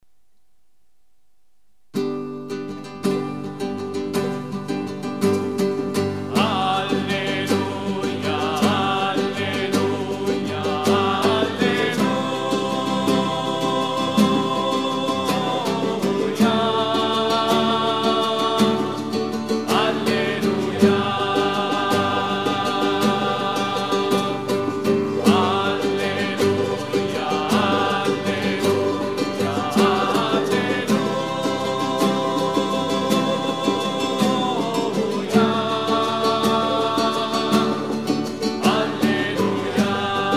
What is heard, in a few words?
Alleluia Gospel Acclamation